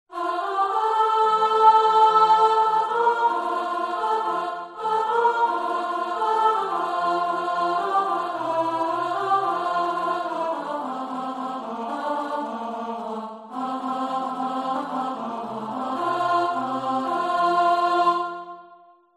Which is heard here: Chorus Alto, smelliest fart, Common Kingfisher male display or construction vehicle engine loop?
Chorus Alto